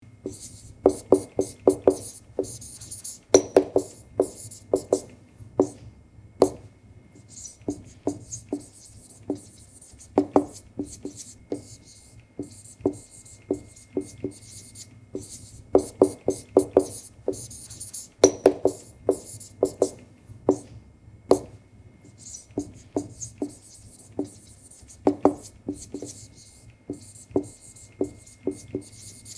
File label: Classroom ambience teacher writing on whiteboard